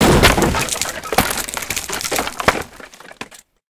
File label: wood crash